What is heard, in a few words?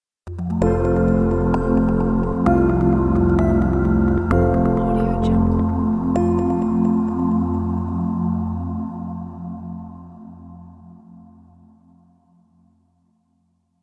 wholesome intro ident